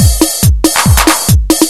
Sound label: 140 Dance